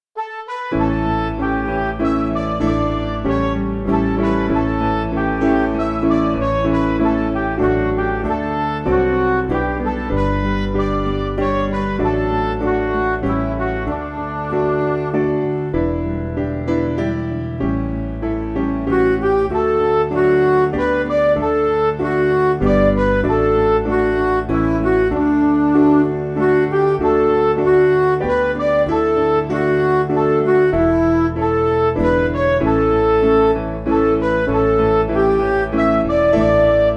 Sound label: Jesu Is Knocking, Midi; O Strand